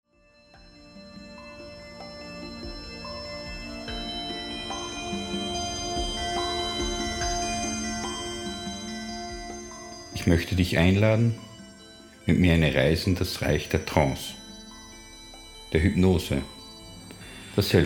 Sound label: Trance